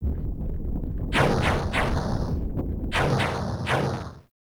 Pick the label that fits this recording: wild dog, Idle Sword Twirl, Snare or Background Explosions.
Background Explosions